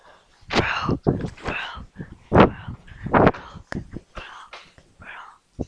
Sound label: frog